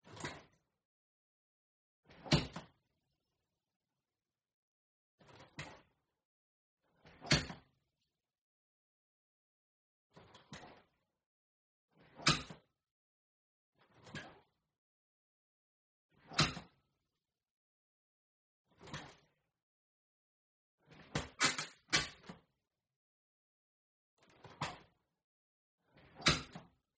WINDOW Kitchen Plastic Handle Open and Close Hard Distant Perspective; gain walkers